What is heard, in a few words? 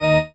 marimba grave; ゆめ2っき